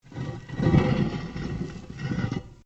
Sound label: METAL ON METAL LARGE SCRAPE; Metal (Sound Effects:Materials)